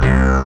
SYN, DANCE SYNTH

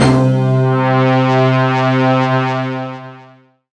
synth c, Sweep Synths

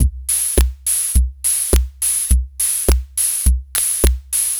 Disco Machine 104, Lounge Drumz

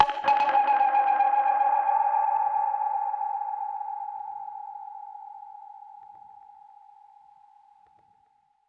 Perc Hit, dub percussion one shots; musicradar

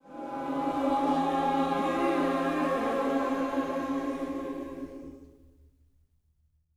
SPIRIT END, Vocal Gospel; SPIRIT SNG

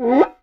QUICA, Percussion; Voice Crystal - Steve Reid Definitive Percussion

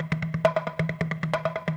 Groove 135; Dance Mega Add On Grooves

